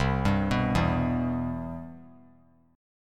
C Major 7th, chord; CM7 arpeggiated; JGuitar

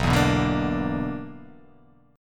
B-Minor Major 7th Double Flat 5th

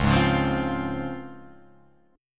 Db Major 7th Db down Guitar Open F; strummed

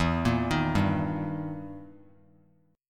E Minor Major 7th Flat 5th, E, down; arpeggiated; JGuitar

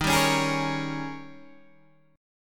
D sharp 11th D sharp, Guitar, Open G

